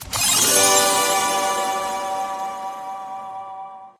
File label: win